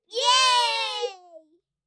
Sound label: cheer; birthday